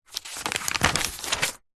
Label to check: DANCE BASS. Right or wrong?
Wrong